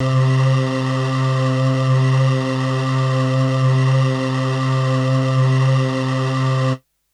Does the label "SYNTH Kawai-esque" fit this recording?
Yes